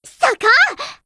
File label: hit blood